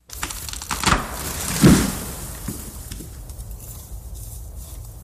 Tree Fall Snap Med Cr; trees

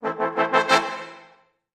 Music Effect Big Jaz, jazz

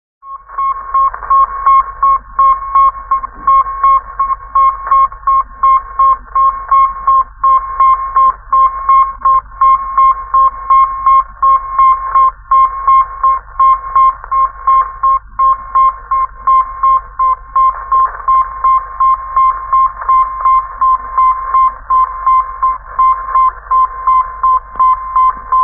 Radio Sputnik Space, static

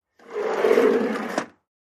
door slide close; sliding

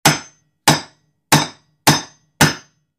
Hammer Nail Metal; hammers

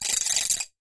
Mill Clack Wooden Rol, percussion shaker

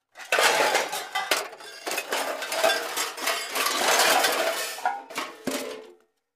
Junk Search Through J, junk crashes; Junk Search Through Junk Pile

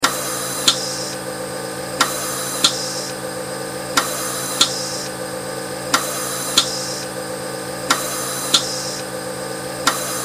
Respirator Pumps Oxy

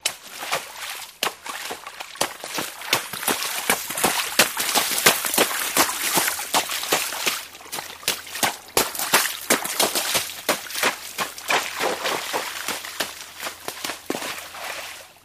FS Stream Long, singles water

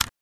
Cassette Play Mini, Cassette Player

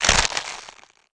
Cabbage Rip Knife Sta, gore stabs; gore stabs & eat

